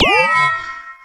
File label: twoiiiihee; Truth-Hz - 1-Hit KO Sample Pack